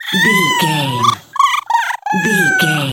goblin fairy cry

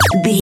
retro collect pickup item

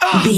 voice male hurt pain

